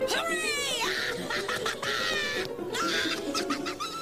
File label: laugh funny ringtone